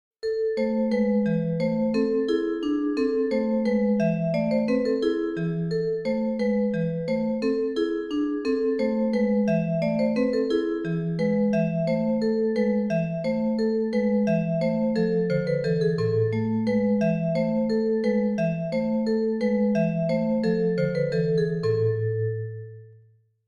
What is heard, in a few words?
gavotte; witthauer; MC Musiceditor